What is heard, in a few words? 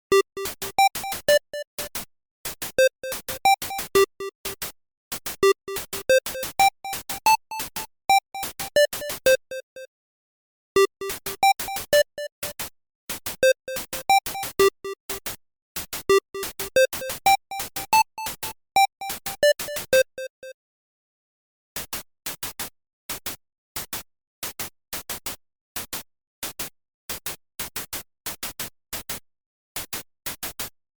start menu, Undertale